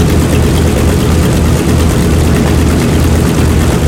b25 side, engines; War Thunder

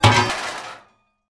objects, World of Tanks

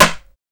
Inf Weapons Foley; Red Orchestra Ostfront 41-45

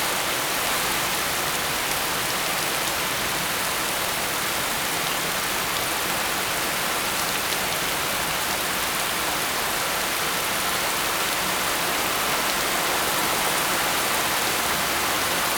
rain heavy

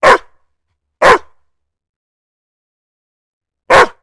Dog, port; World of Tanks Blitz

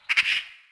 magpie; World of Tanks Blitz; ambient medvedkovo

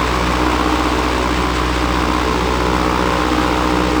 Packard Merlin Prop Cockpit Prop In; DCS

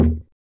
Tube Thunk; Civ4 warlords

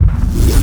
nograv ignite

